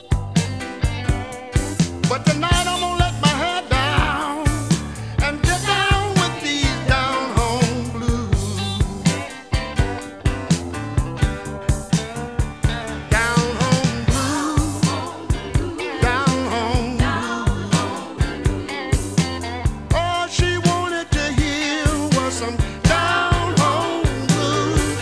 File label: Blues